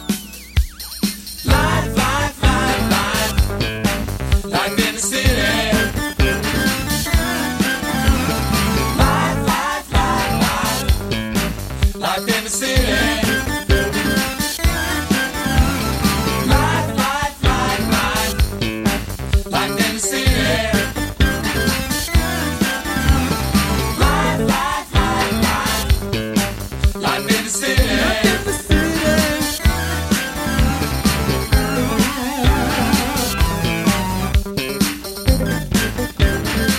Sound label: Life in the City Instrumental